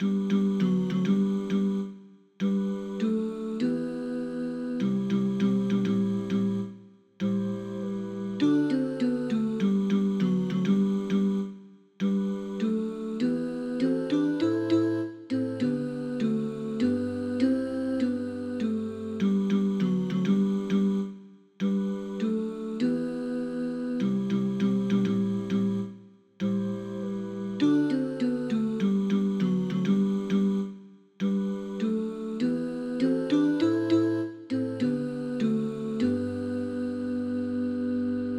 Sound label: tenor